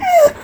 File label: alert; Frost Avalanche Pup